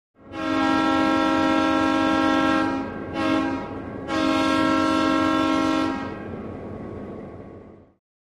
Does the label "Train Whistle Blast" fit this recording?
Yes